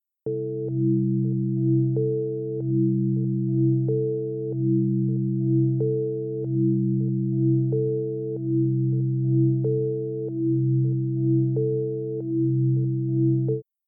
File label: Five Tones; Powers To Amps